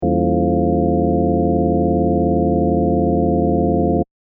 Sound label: FM over E dim7